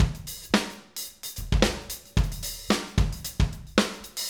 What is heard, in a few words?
Acoustic Live Drum; Platinum Audiolab